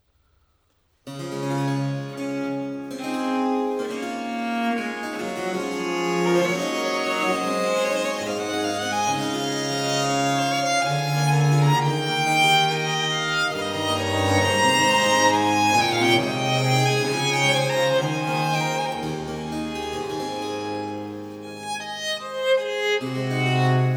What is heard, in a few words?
Largo; Trio Sonata in D Major; TWV 42 D13